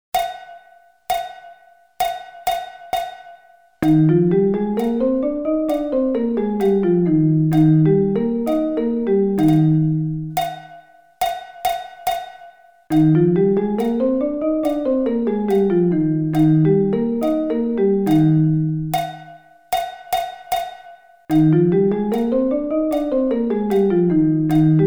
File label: Eb Scale 1 oct